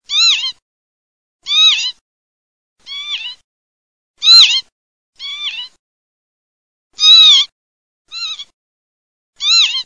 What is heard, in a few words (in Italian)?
pavoncella